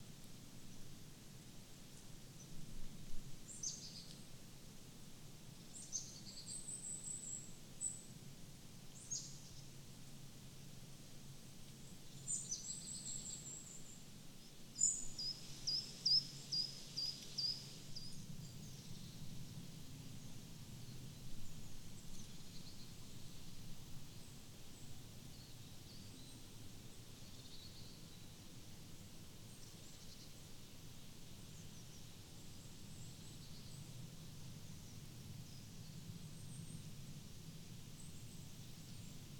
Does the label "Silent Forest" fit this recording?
Yes